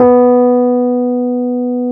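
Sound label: E PNO B2; Synclavier Sampler Library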